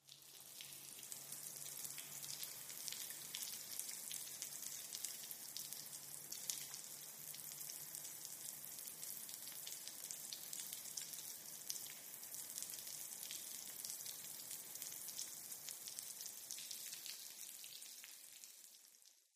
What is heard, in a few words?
Water Spray Drops, sprays & hoses